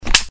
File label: misfire; Blackeye